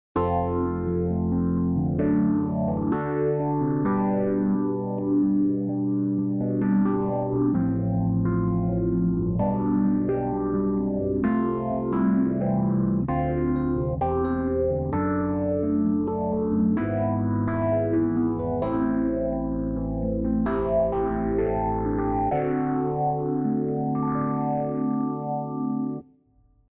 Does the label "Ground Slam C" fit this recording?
No